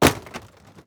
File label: plastic break